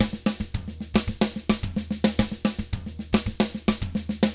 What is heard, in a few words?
SMBA, 110 SAMBA, Drums; ILIO; Double Platinum Drums 1